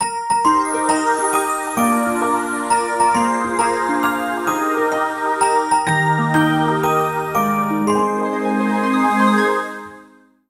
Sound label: Title Screen Birthday Jingle